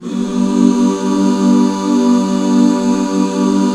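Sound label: G major, Back Chords; Sound & Vision - Gigapack II CD 1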